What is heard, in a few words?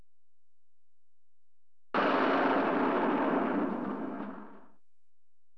explose